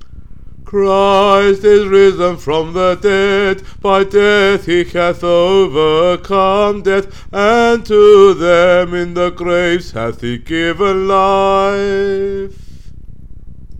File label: VOICE fast; Christ is risen